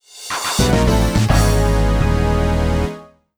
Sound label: Loss; Super Monkey Ball 2; Monkey Shot